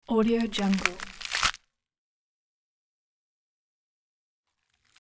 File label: bite and break lettuce; hollywoodedge